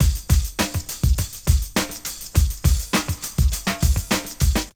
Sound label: D3 BREAK